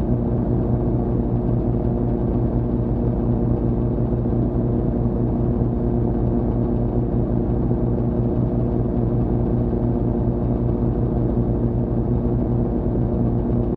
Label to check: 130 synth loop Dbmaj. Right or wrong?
Wrong